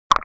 OUVRE

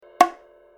clic; MOBICLIC174

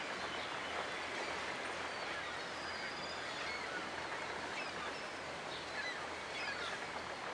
oiseau

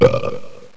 rire; iWave Interactive 01

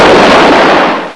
Boum; Tron 96

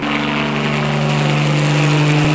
Avion

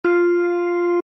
musique

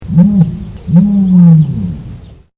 autruche; MOBICLIC 64

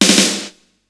caisse; MOBICLIC

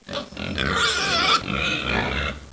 chat; MOBICLIC

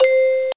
pinceau; Mobiclic

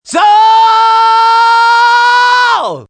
chant; MOBICLIC 83; CPLUSCLAIR

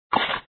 pompe

